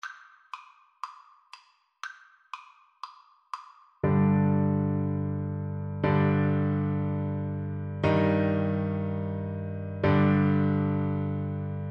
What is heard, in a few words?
French Horn